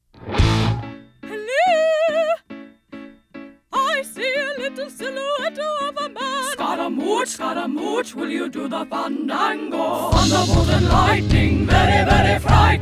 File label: Singing Characters